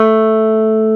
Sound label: GBSN 335 A3, EL.G; AKAI S-Series CD-ROM Sound Library VOL-2